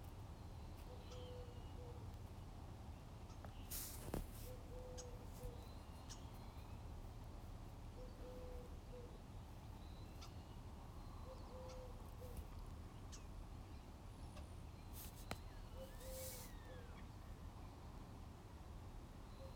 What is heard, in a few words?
Rufous HummingBird